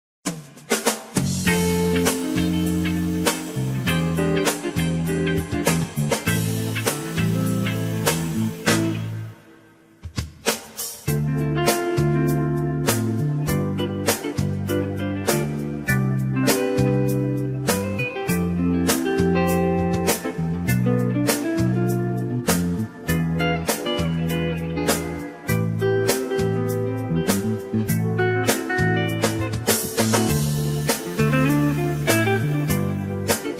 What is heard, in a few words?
Smooth Jazz